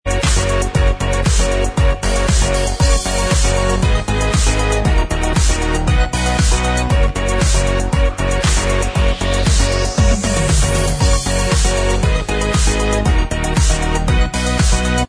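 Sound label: instrumental, track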